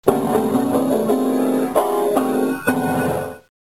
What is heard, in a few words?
piano